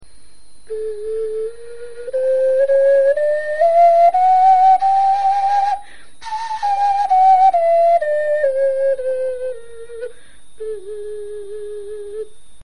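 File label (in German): tonleiter, Knochenflöten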